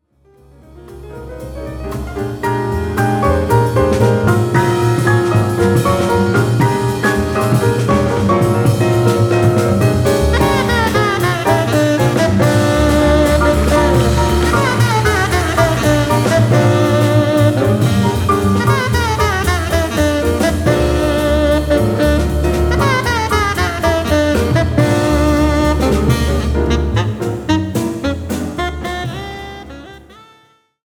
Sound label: jazz; ジャズ（レーザーターンテーブル使用）; 60年代ジャズ(24bit・96MHz)